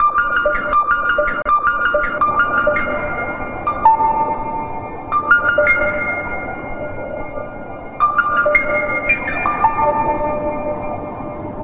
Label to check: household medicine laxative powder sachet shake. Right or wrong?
Wrong